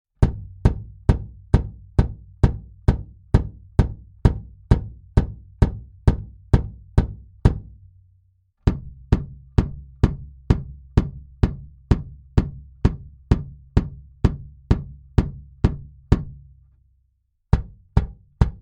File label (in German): BD Mic Test Tech II alle Pos